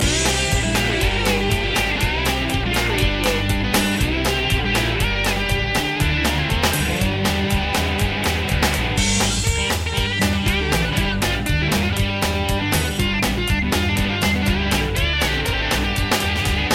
rollin blues loop

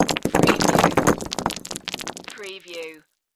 Rock Debris Spill

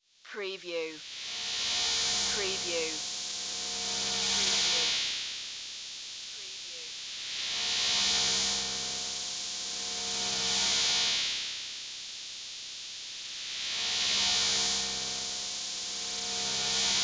Strange Emissions

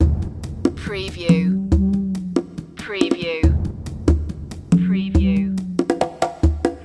deep jungle loop

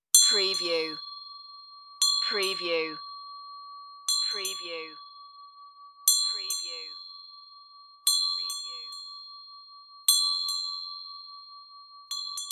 steady ringing chime

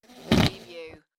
Whoopee Farting Blowing Raspberry Sound; beanstalk audio

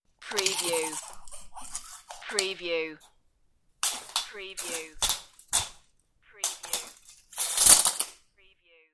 Tape Measure

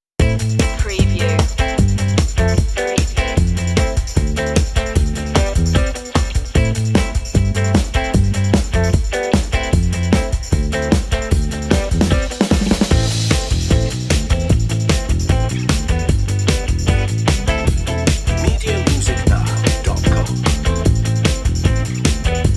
underscore no synths

